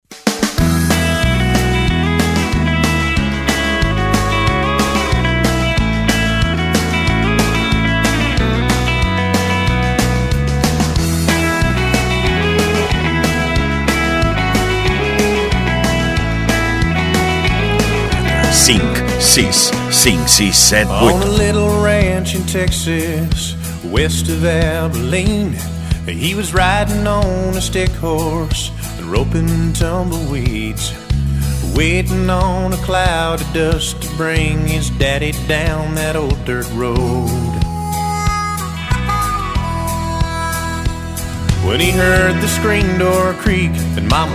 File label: BREAKING HORSES